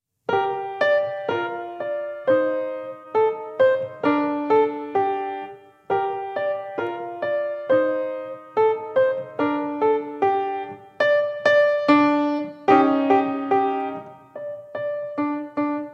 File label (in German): anfaenger piano; musikschule; instrumentalunterricht